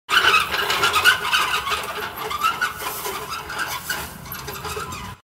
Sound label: car jack; بالا پایین رفتن; جک ماشین